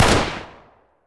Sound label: g36c fire; garrysmod